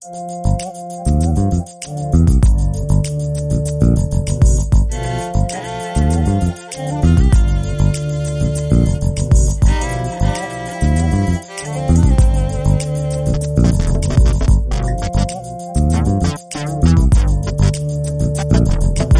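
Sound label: Shaker, 98 bpm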